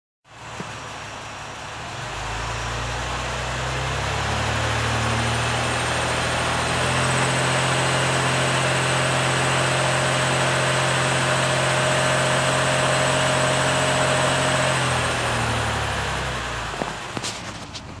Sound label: strange squeak, 3.0 Tribute car noise; mazda tribute